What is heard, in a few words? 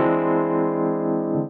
DC Frisco CHORD, breakcore; voxandchords